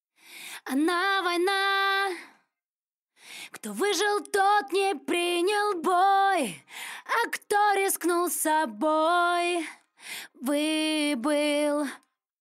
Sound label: Acapella Snippet